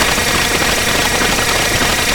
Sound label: Loopable Mechanical Malfunction Rumble